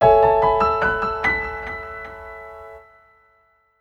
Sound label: Startup; SpotPass TV; nintendo 3ds